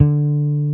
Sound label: BAS; Best Service Gigapack 2